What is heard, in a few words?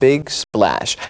Vocal Phrases; Vengeance Samples; Vocals